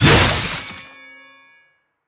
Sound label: attack slash